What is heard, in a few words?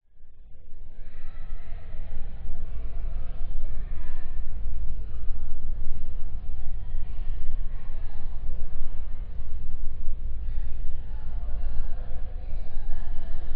public places public toilet cafe museum people talk outside